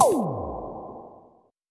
FX Hit; Vocal-Hook Kits; 140 BPM; Rap

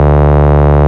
Bass D, Root D

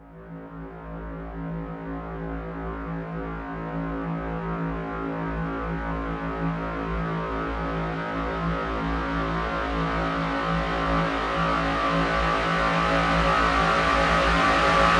128BPM Dark Saw A, Melody Fills; 8Bars; Vengeance Samples

